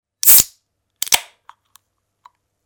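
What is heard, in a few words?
beer opening sound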